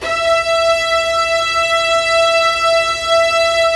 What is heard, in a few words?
STR SEC, STRINGS; Roland - LA Composer vol. 1